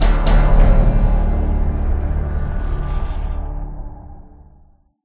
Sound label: Game Lose; Dicetiny The Lord of the Dice